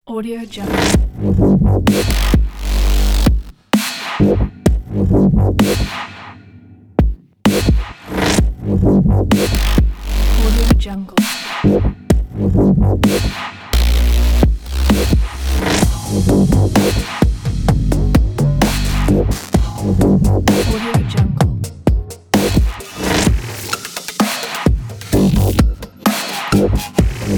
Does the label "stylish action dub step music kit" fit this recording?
Yes